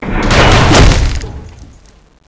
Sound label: tentacle, scorpion